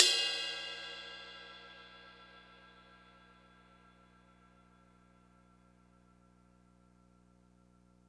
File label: Cymbal; Sonic Foundry; Sony Creative Software; Hydroponic Hip-Hop; Beat Components; Hi Hat Cymbal Patterns